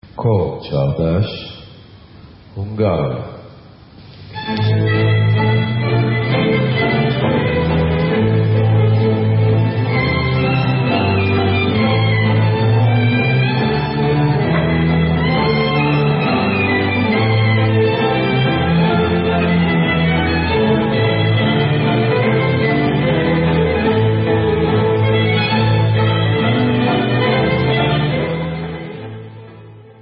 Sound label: Kor Csardas, Hungarian, Instrumental